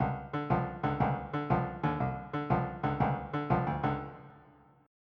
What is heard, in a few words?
Reggae